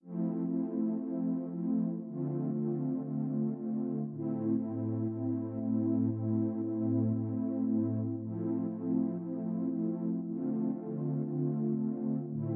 117 BPM A Min Pad, Sunset; Melodies; Loop Stems; Cymatics; GHOST (Beta Pack); Blizzard

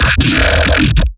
Riddim Bass Hit; Discomfort

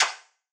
Vintage Percussion; Chalk; Cymatics; Mystery Pack Vol 4

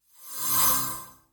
Flash

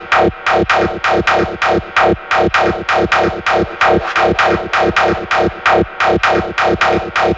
Synth Loop 130 C#, Techno; Loops; Synths; GhostHack; Ghosthack - Free Techno Sounds 2024

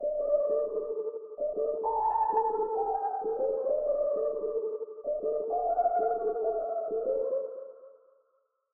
131 BPM D Min Lead; Loop Stems; Cymatics; 2022 Melody Collection Platinum Expansion - Pt 2; Triumph

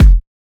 Kick, F#; Kicks; Future Bass; Drums; One Shots

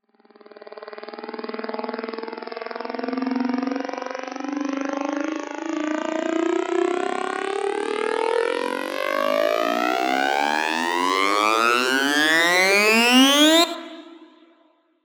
Buildup, Dubstep

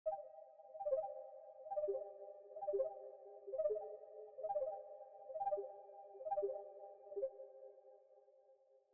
132 BPM C Min Synth Arp; Melody; Trap; Loop Stems; Cymatics; 2022 Melody Collection - Trap Pt 1; Frequencies

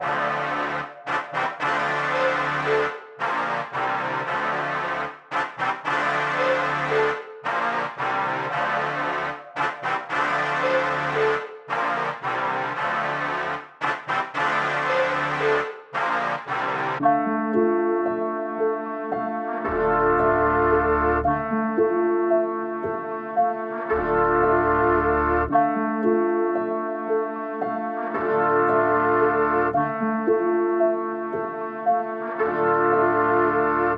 113 BPM D Min Brass, Melody Loops; Loop Stems; Cymatics; Bermuda Pt 2

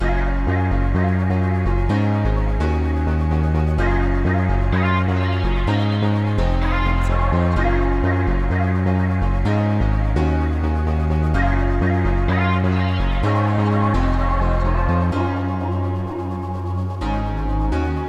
127 BPM G# Min, Euphoria; Melodies; Allured

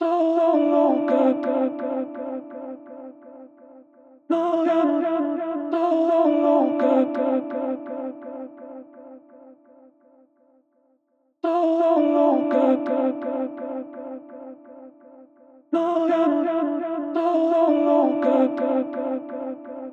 168 BPM D Min Vocals; Melody Loops; Always Yours; Cymatics; ANALOG EVOLUTION Beta; RnB Collection

